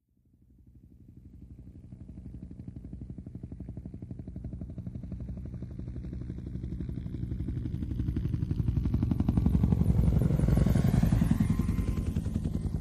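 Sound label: Ah1 Cobra Heli By Medium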